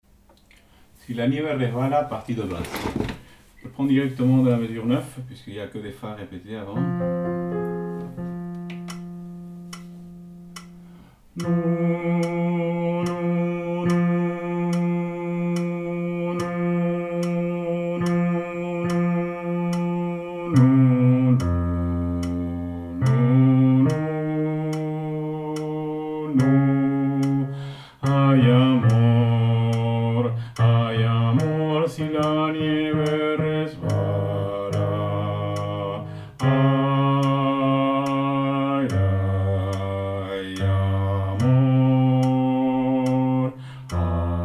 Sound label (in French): Basse